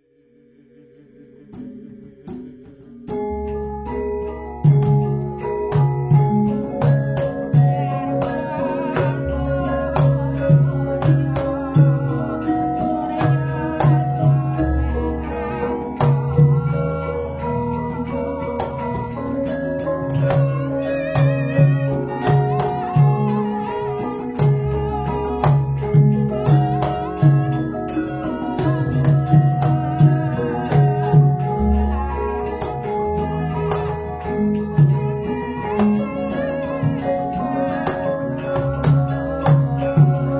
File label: Gamelan Surakarta; World Sounds